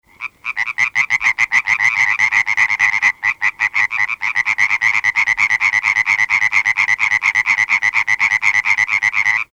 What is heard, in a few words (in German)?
Laubfrosch-Ruf; Winfried Krey